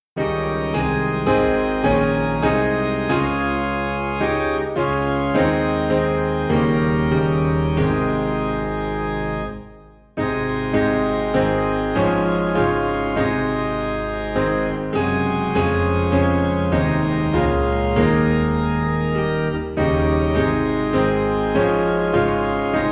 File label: Piano and Organ Low in A Flat; Lead On O King Eternal